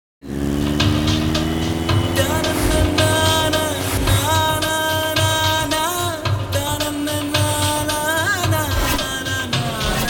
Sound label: Theme Music; Anbe Aruyire (2005)